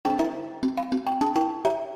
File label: MODERN XYLO